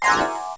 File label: No Stamp; Treasure Galaxy (1994); Learning Company